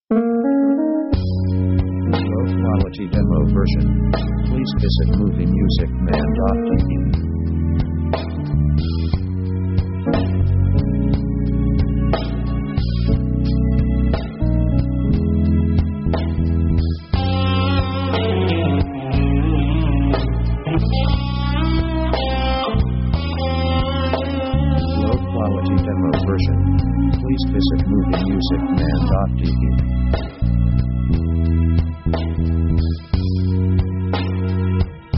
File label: blues sketch 60bpm; Basically slow